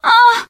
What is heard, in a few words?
hurt minor, teengirl survivor voice; Left 4 Dead; Source Engine